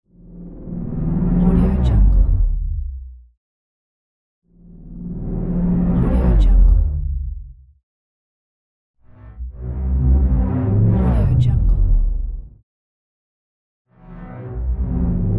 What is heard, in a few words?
resonant whoosh